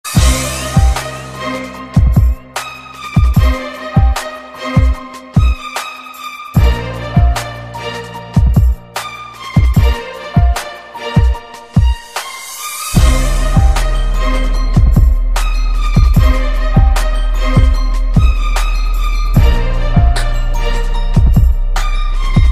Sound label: hip hop